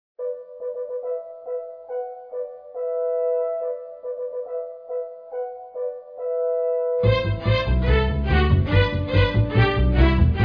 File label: Nutcracker March Instrumental